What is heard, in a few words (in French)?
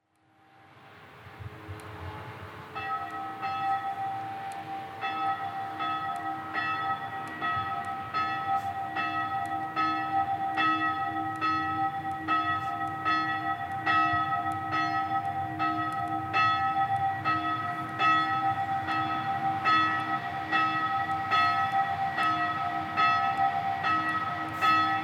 solos, cloches; Villaz-st-Pierre